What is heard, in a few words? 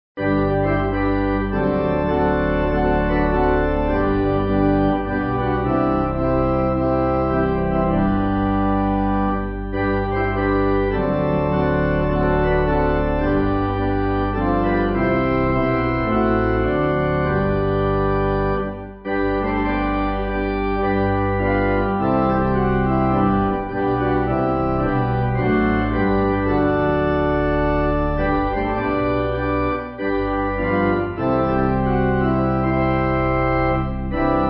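Pipe, pipe organ; I Am Thine O Lord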